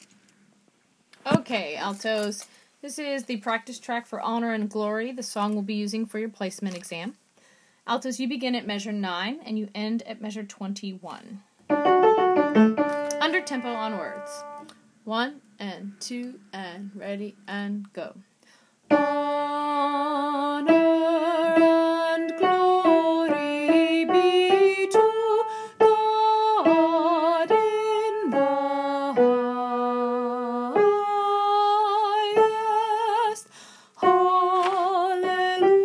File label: Alto; Wando Choir Class Placements